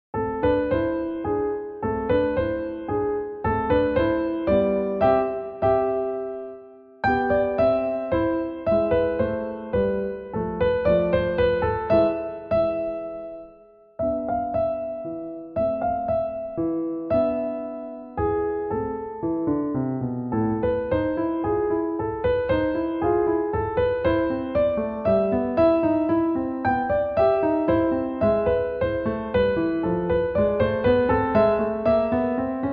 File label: Polish song; hiller ferdinand